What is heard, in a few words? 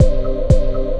Loop Bit, Processed Percussion; Sonic Foundry (Sony Creative Software); Rhythmicronics